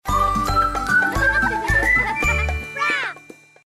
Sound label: sprout jingle